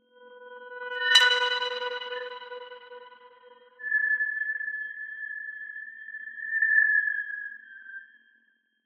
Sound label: sonify ss433 xray